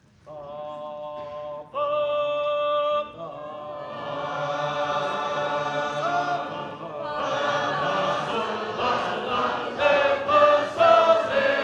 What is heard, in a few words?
New Agatite, sacred harp